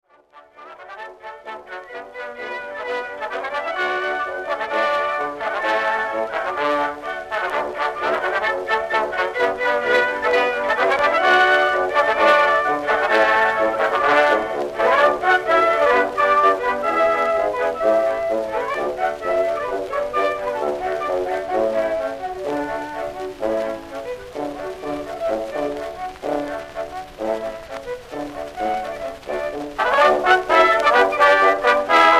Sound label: Dixie Band